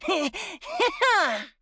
ヘッ、ハッハー！

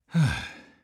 はぁぁ

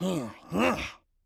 ンーン

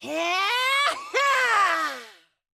ヒャーッハー

